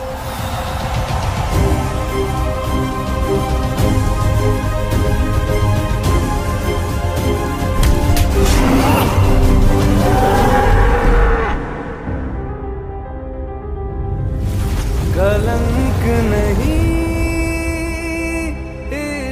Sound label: Movie Background Music Ringtone; ringtones; Kalank